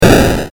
explosion, sfxr

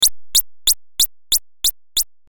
birds, saw; sfxr

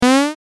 powerdown; sfxr

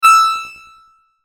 laser beam; saw; sfxr

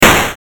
explosion, sfxr

